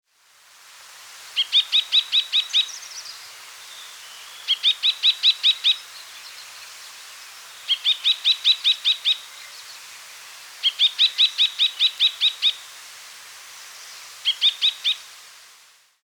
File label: white browed rosefinch